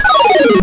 Enemy Fall; An enemy falling; zelda

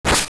Sword; Link swinging a sword (swoosh); Zelda

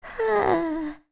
Old Lady Sigh; Old Lady sighing after being robbed; Sakon (the thief)

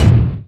Step Slow; Ganondorf

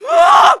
Cast Painting; Yuga casting a painting spell on Link; Zelda

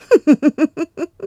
Chuckle; The Lady chuckling

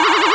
Mirror Shield Reflect Ice Loop; Zelda; OOT

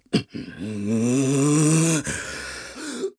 Wind Up; Ghirahim winding up his sword; Skyward Sword

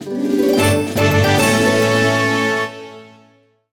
Fanfare Small Item; "Get a Small Item" fanfare; zelda sounds; Skyward Sword

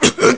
Dizzy Hurt; Byrne getting dizzy or hurt; zelda

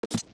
steps wood land; Jumping and landing on Wood (young); OOT

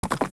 young gallop; Young Epona galloping; OOT

